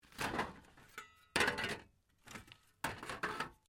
METAL SCRAPS MOVE; Metal (Sound Effects:Materials)

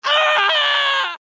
Horror Scream Male; Screaming; Voice

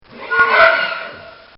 Horse Scream; Animals; Bobby Cole

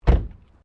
CAR SUBARU LIBERTY DOOR CLOSE

